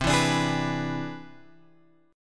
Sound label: C Augmented Major 9th, Guitar, Open F; strummed; JGuitar